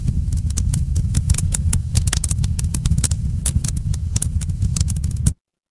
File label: fire electric crackle